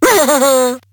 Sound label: pain; Awesomenauts